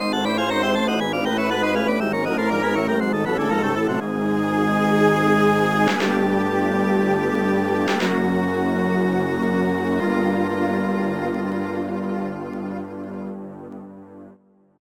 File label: Unknown Jingle; The Haunted Mansion